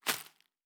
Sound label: Leaf Walk Surface, Footsteps